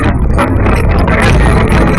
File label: toilet